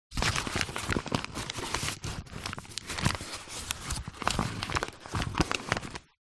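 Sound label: food flour bag paper handling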